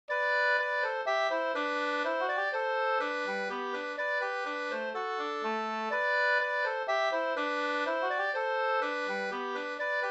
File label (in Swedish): polska; e Pehr Ericsson Leffler